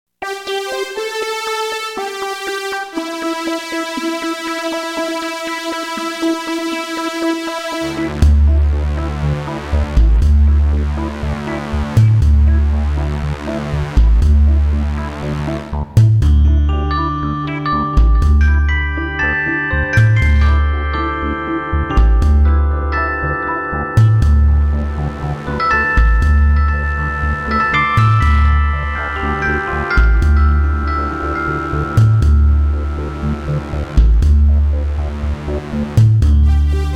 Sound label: Synth Water Wave